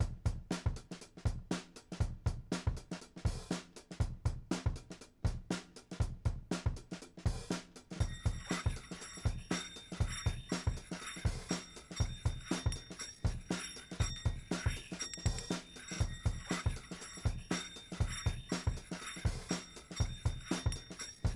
Microsound Breakbeat

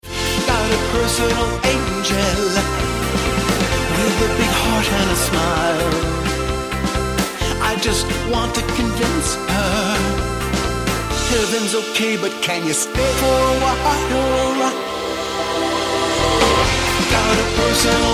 Dancing with Drums; waves

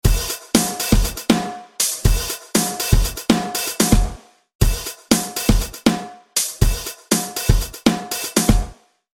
drum denoise; waves

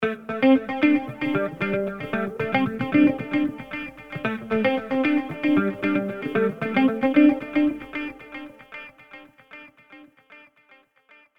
add rhythm; waves

